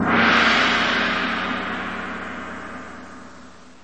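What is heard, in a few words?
gong single bright metallic in space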